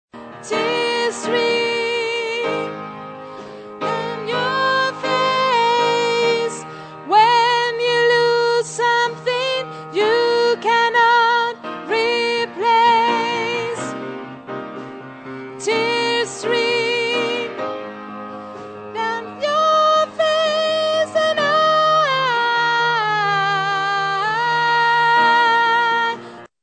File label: tenor